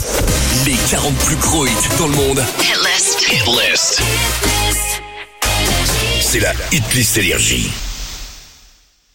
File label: Jingle; NRJ